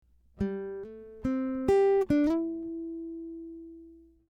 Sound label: guitar slides phrase; slides on guitar